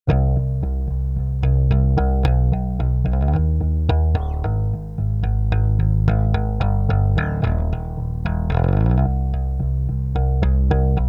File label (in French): basse